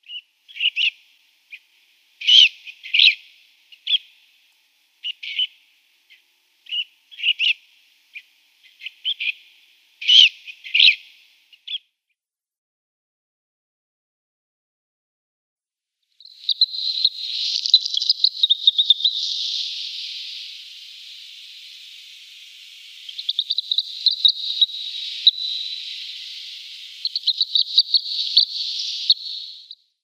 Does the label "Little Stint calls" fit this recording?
Yes